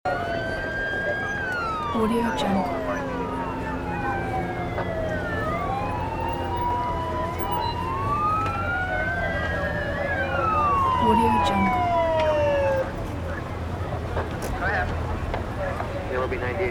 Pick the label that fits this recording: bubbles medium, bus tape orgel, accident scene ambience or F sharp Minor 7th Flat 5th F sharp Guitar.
accident scene ambience